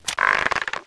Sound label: crossbow pull back